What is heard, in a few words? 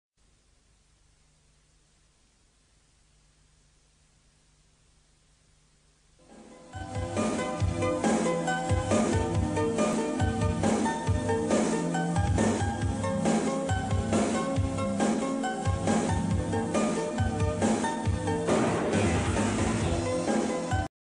Seven Keys logo